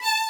violin

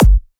Dr Kick

